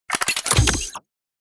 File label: Sci-fi weapon reload gun reloading